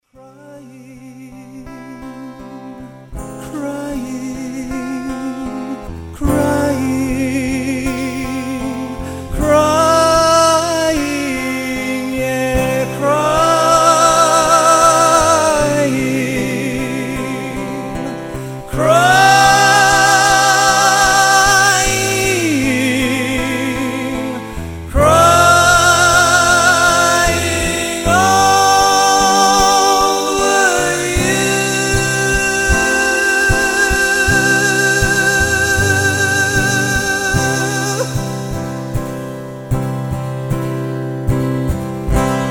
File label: crying, acoustic duo